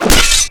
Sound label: dismember swing; weapons; dota; pudge